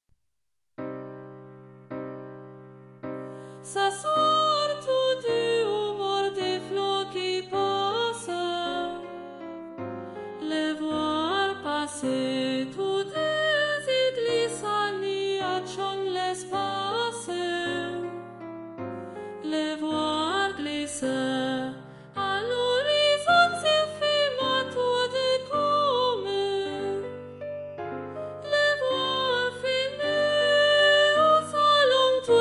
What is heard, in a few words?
soprano; Au bord de l'eau; Gabriel Fauré